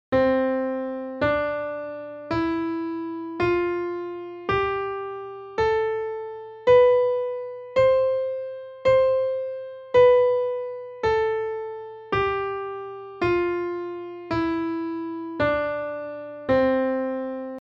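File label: choking guitar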